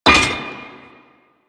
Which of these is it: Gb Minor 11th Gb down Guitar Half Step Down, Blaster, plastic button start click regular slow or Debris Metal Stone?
Debris Metal Stone